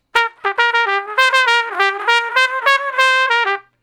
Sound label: Trumpet Straight (G); Crimson Blue and Fabulous Horncraft 4 RnB; Horncraft for R&B; Solos